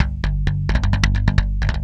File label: Brush, Industrial, Nasty